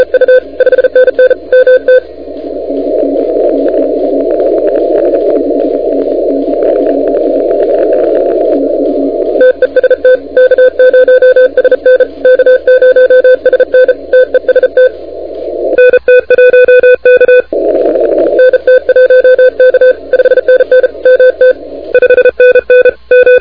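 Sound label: continuous wave 28MHz; ARRL10M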